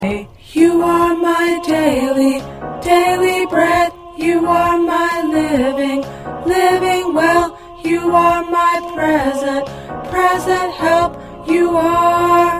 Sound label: Tenor, choir